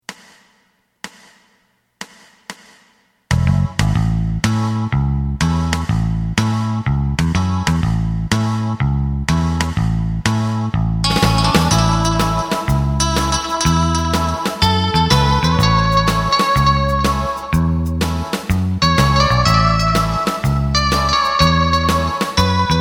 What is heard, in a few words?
lonely guitar full